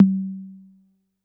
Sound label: C3 Analog Tom 5, Yamaha PSR-E323